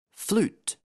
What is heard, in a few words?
flute; Old Mother Hubbard